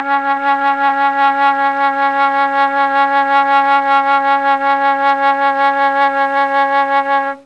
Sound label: C#2 TRON FLUTE, Mellotron; Mike Pinder - Mellotron Archive CD